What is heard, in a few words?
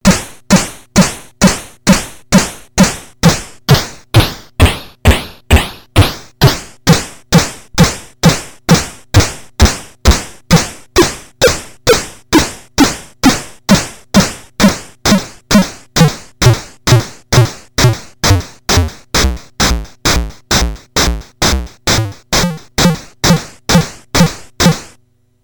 drums sd examples, midibox sid